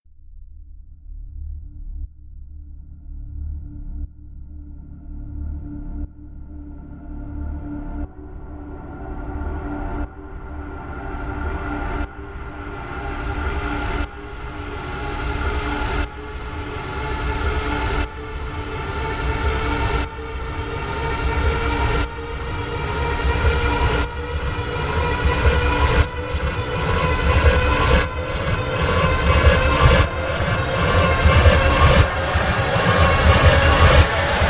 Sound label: trailer backends burning souls